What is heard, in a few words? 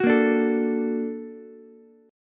A sharp Augmented Major 7th A sharp Ukulele; strummed; JGuitar